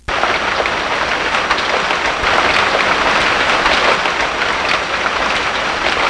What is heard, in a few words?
rain